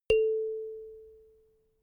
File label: notification kalimba single